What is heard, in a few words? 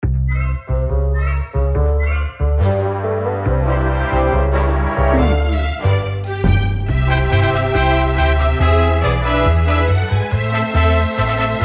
broadway bigwig no drums